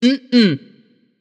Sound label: nv disagree; smite; voicepack; morgan le fay